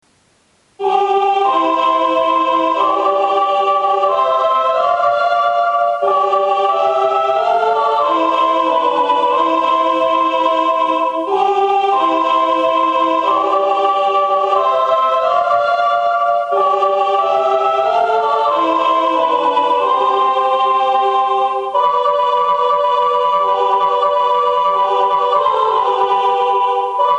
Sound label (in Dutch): Sanctus-Benedictus SOPR-ALT; sintjanskoor